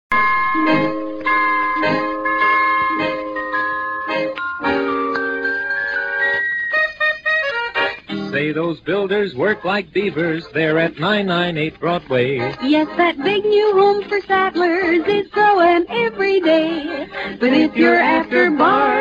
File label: jingle